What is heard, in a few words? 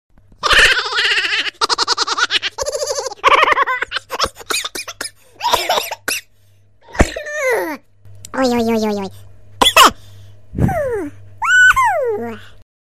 a ha ha funny